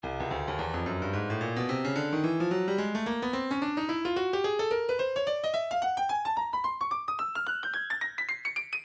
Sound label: breakbeat 109 bpm; soho d; Stylus Breakbeat Midi Files